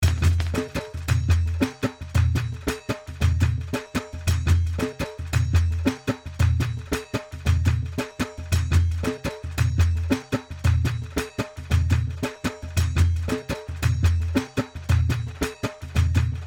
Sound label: Indian Drums